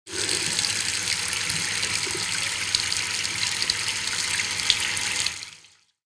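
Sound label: jyaguchi, faucet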